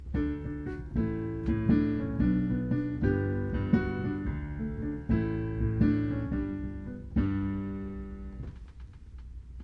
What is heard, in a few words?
right hand arpeggiated smoother